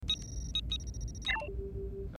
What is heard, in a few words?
computer activate; Trek